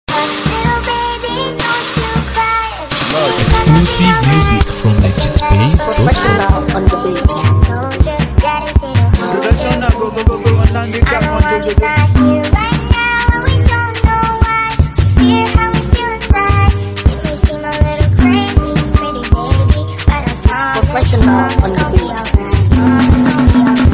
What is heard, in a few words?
Professional Beat, Ogba Beat